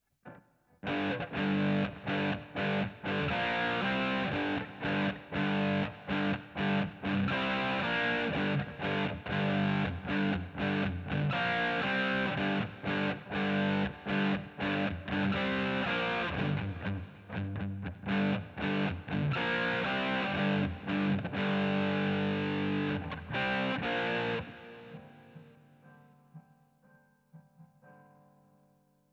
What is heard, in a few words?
GTR